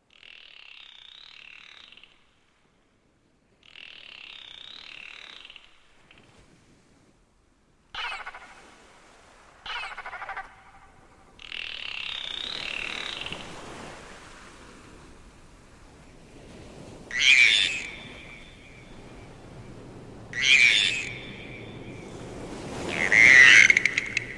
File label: Dolphins sounds